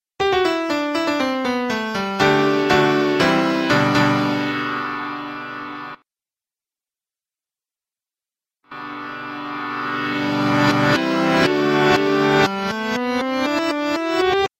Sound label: piano